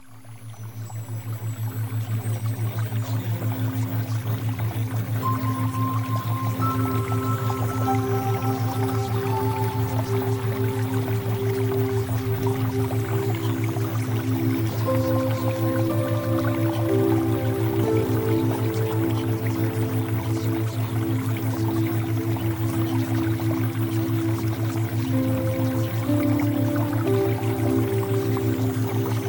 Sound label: Music Theta Waves